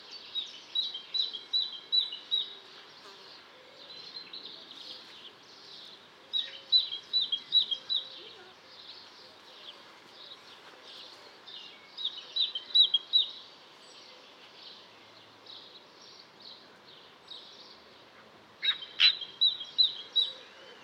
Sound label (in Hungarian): szencinege Parus major